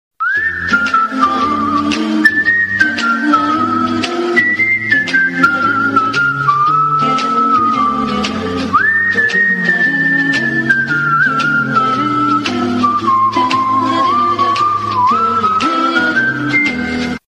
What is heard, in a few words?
western whistle ringtone